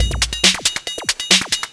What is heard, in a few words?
drum 138, drum-loops, 2bass, Techno